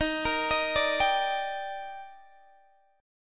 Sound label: Eb Major 7th, Guitar DADGAD